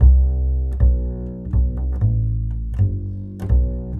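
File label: Walking, jazz; Jazz (Джаз)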